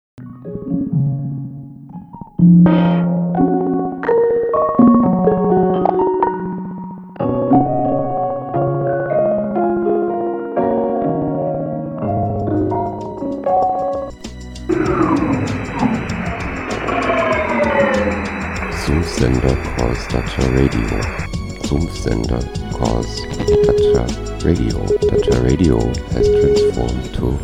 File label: Jingle